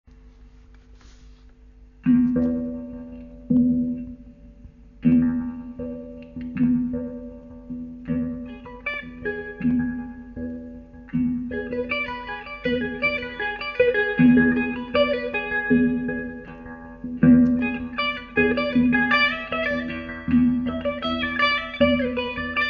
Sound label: moog